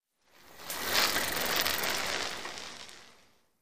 Biking Bike By Heavy

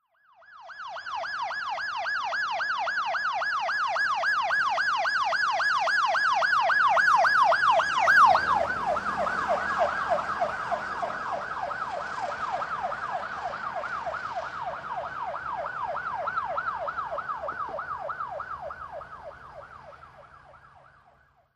Ambulance By Medium, ambulance

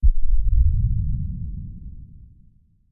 synth effects boom, sci-fi booms

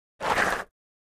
Object Dragged Acros, rocks & dirt

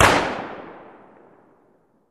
Gun Shot Sngl Ext

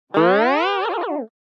Cartoon Effect Guita

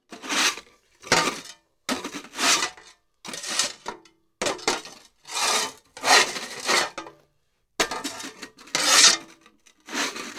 Shovel Dirt

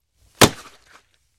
Football Grab Heavy, football

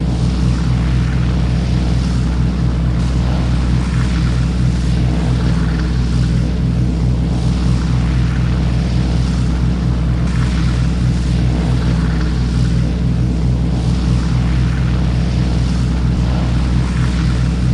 B17 Ext Constant Loop, b 17 flying fortress

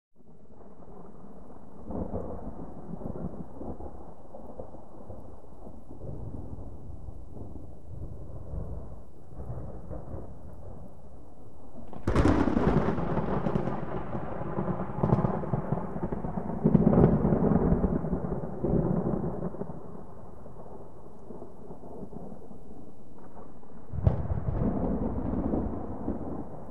Thunder Medium, cu

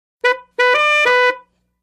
Old Car 2 Tone Fanfare

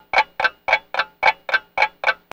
Clock Ticking Loop, ticks

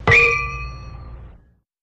Ding Metallic Hit, chimes & bells

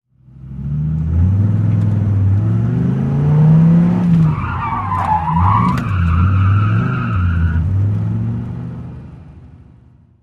Idling Accelerate, sports car

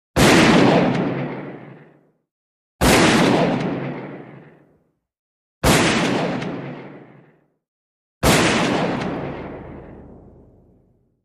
12 Gauge Shotgun Sing, shots

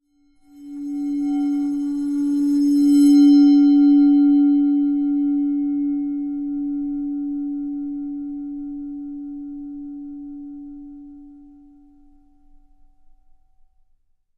Tibetan Bell Bowed

